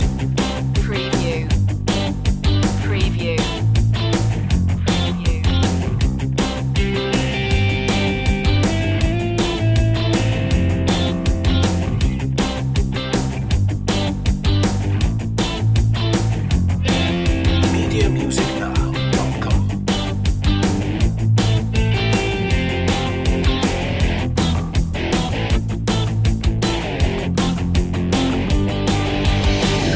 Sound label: punky loop